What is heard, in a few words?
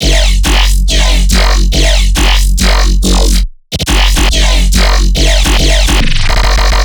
Riddim Bass Loop F# 140; Ghosthack; Riddim Essentials Volume 2